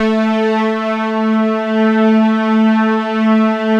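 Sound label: OBERHM OBXA, Oberheim OB-Xa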